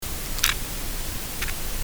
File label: Corydoras ambiacus, Amazon, soniferous